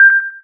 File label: cursor